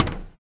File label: footstep metal low walk; MONO; ProSoundCollection v1 3